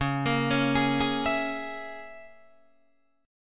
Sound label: Db Minor 6th Db down Guitar Open D